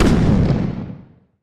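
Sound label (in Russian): неожиданный одиночный взрыв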